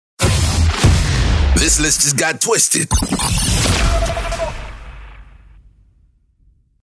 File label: Drops